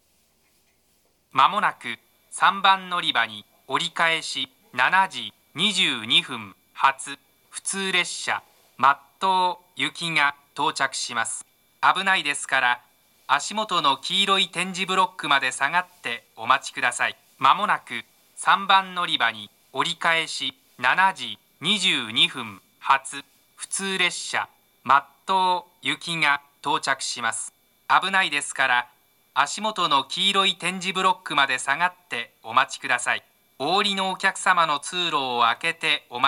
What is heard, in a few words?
kanazawa turn local; matto